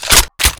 m249 cover down, weapons

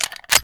mac10 clip in, weapons

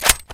aug bolt slap, weapons

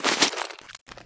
ready, weapons; p90

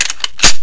mag in, weapons, galil

